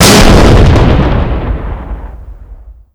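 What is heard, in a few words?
explosion metal barrel distant; Explosions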